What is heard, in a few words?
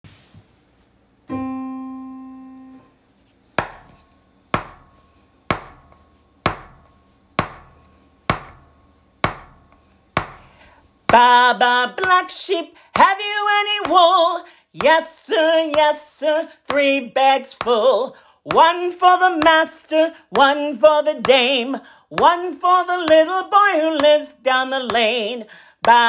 Baa Baa Black Sheep C4